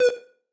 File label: synth